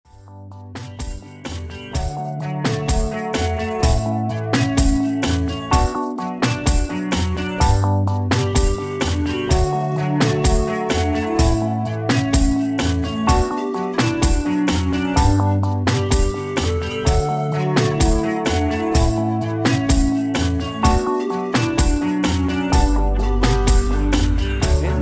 canto

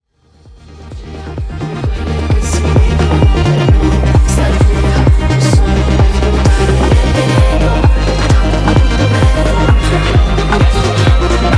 Groove Continuo; Sanremo

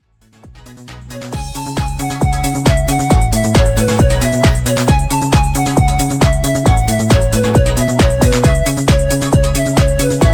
Ballo Di Gruppo Con Rap Canto

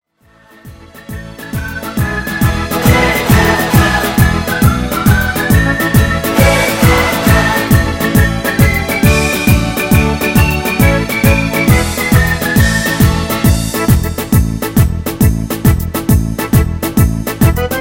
Tarantella Ballo Di Gruppo Canto

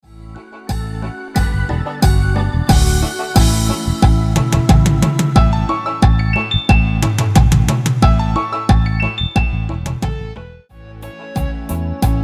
Cumbia Canto

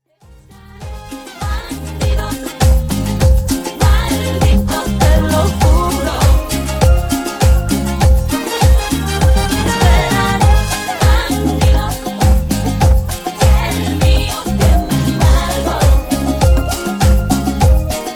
Cumbia Remix Ballo Di Gruppo

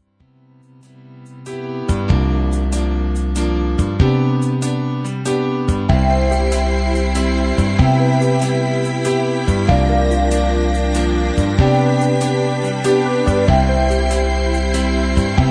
Valzer Lento

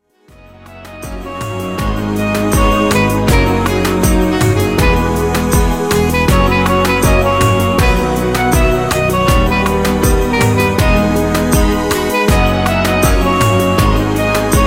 Kizomba En Rose Canto

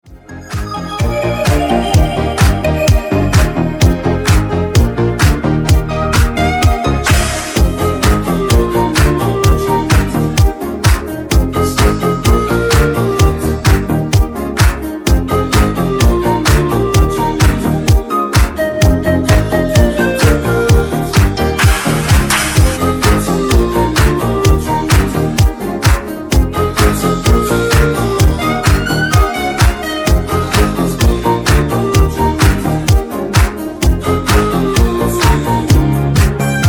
Ballo Di Gruppo Canto

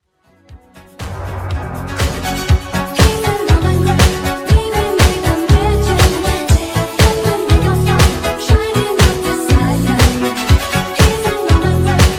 Voce Donna Canto

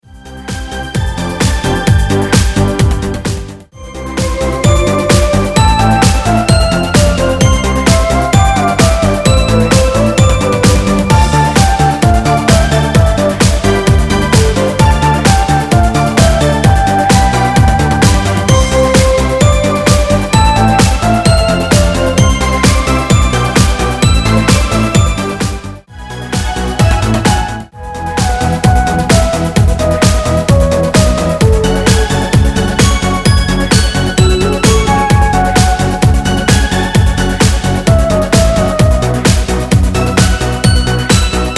Dance Remix Ballo Di Gruppo; Canto

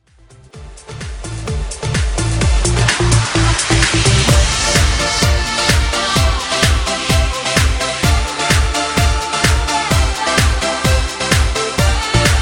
Remix Ballo Di Gruppo

